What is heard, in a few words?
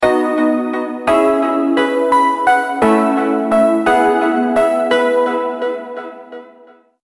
Melody Rhodes